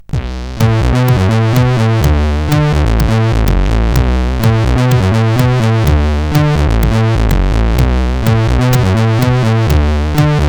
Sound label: Simple Bassline, CSG8580R5